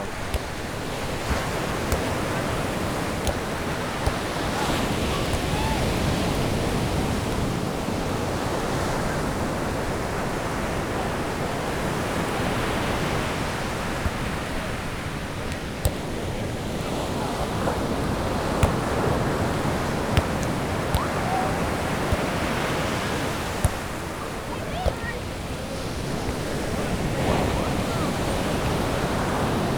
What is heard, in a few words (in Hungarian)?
copacabana labteniszezok kuszomester sipolasa